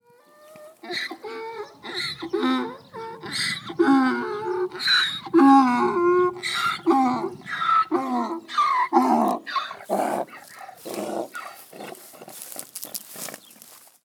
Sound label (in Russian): Домашний Осёл Киприотский